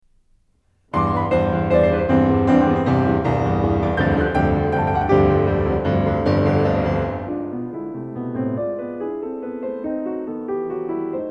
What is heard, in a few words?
33 Piano Variations in C; Anton Diabelli